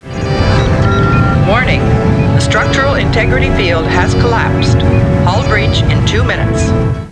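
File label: hull breach; startrek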